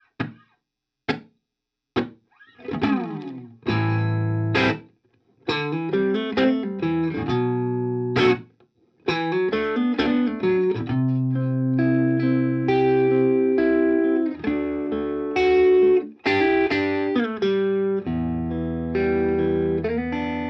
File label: tl drive center